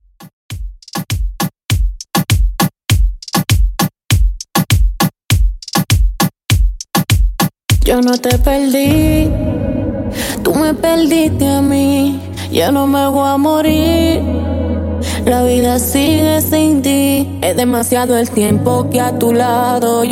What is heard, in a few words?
Reton 100 bpm